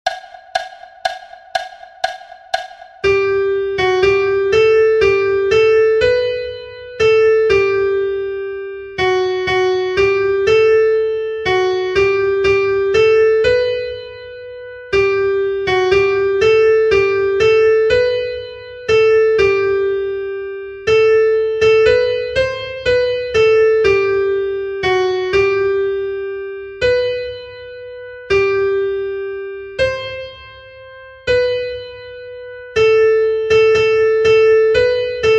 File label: audio for melody; Grace Greater Than Our Sin